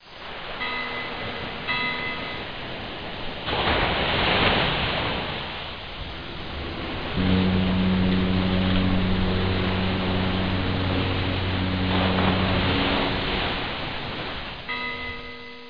ocean

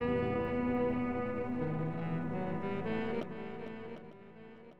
HORNBG; HipHop Fx; LiveAct Pool 1

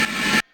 Reverse; Logic Fun

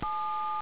dial; 12000 Graphics Pack - A Complete Graphics Collection For Your PC

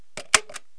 Staple Gun (One Staple); KABOOM!; Construction

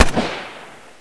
Gunshot

